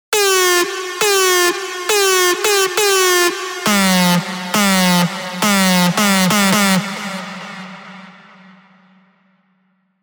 Beep Beep; revealed spire mainstage drops

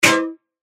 E, percussion; revealed percussion vol 1

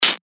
FX Hat, cymbals; revealed cymbals vol 1

